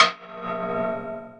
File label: EQ Perc, Percussion Mix